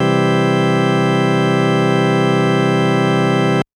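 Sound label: Organ Synth major; TrashLibrary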